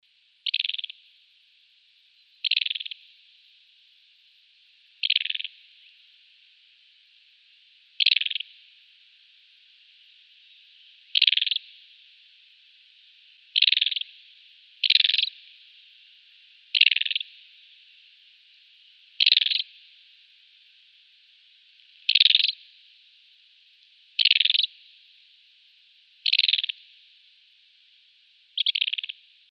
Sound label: Desert Wheatear alarm call